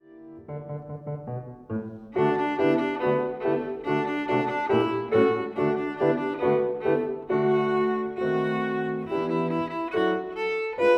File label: VIOLIN